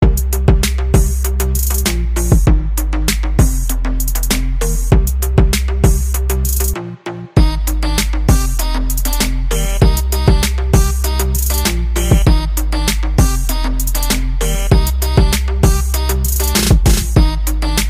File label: trance romantic